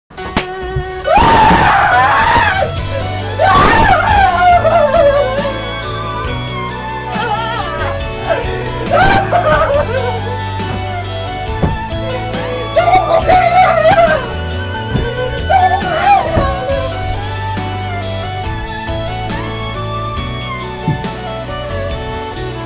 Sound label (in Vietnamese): Thầy Ba khóc; khóc đập đầu xuống gối, nhảy dựng lên giường và gào khóc; Thầy Ba dỗi; đập gối tứ tun